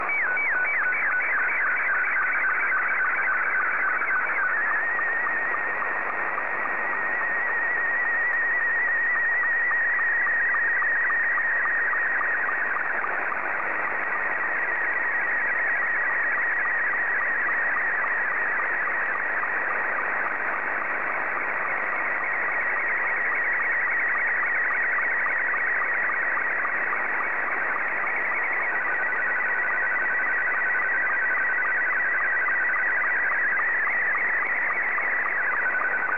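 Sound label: slow-scan television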